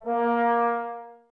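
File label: brass trombones A#, Woodwind Brass Ensemble; 90 s Sample CDs; Miroslav Vitous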